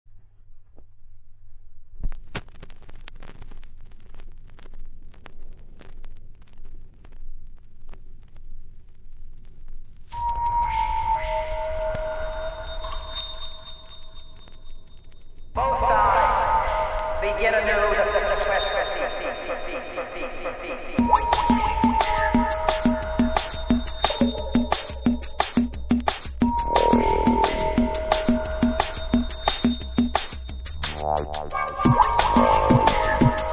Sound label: Drum and Bass Jungle and Old Skool; LURKING IN THE SHADOWS LIVE; UK Bass Radio